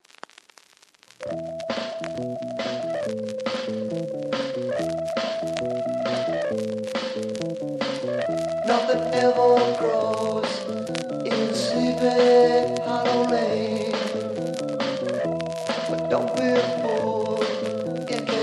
quintet

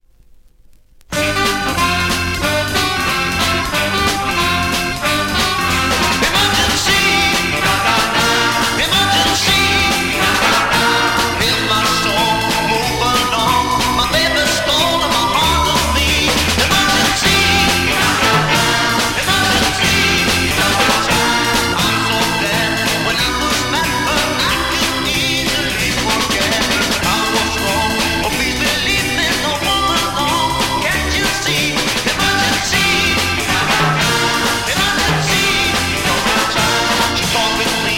blues

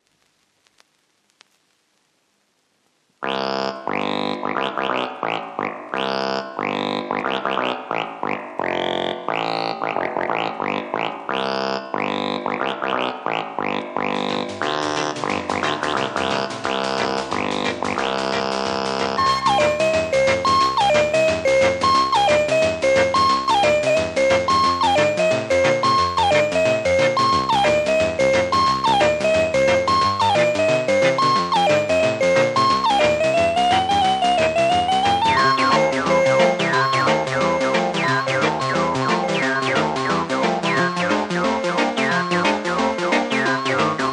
moogie boogie

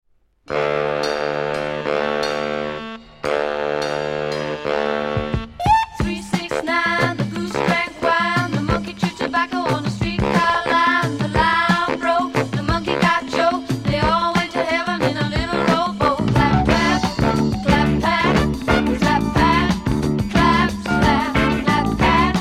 Clapping song

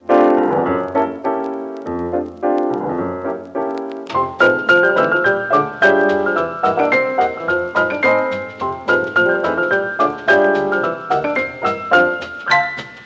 polka; luxembourg

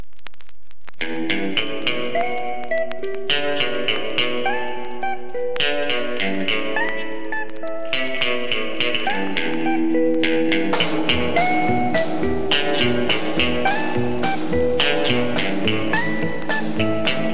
wheels lonely guitar

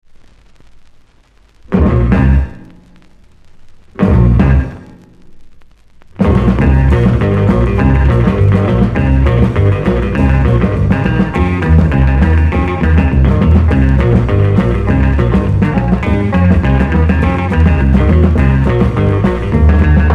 Big Beat amiga

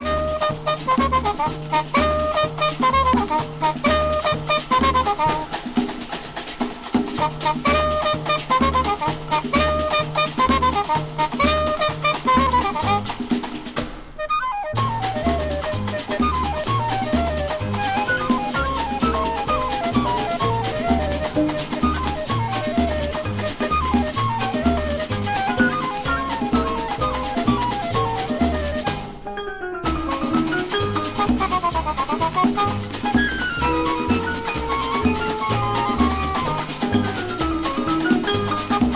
flying flutes

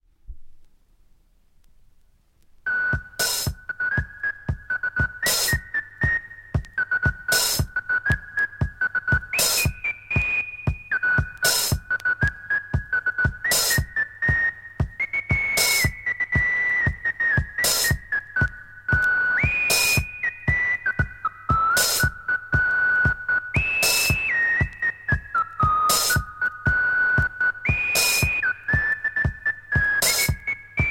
whistlers march

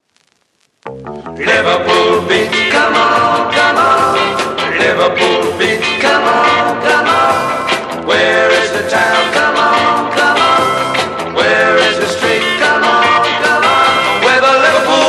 liverpool beat

